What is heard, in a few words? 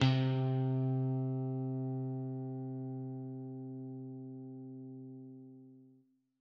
Guitar, RB Flava; rnbflavampcexpansion